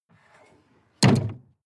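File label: sports car exterior trunk close; verkehr-transport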